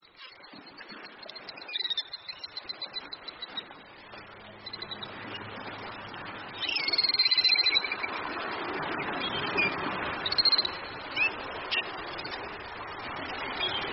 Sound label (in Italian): delfino